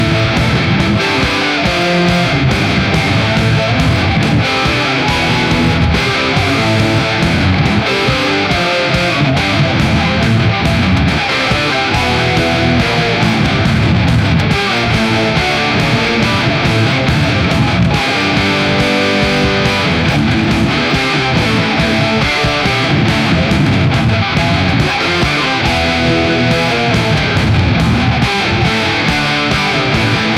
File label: energetic rock riff with